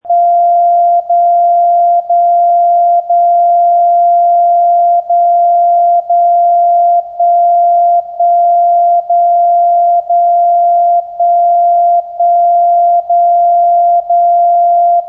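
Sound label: DCF77 Audio Signal; DFC77-Auswertung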